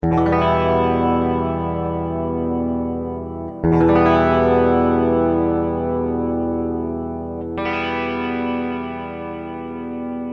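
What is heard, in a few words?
clean electric guitar dimension c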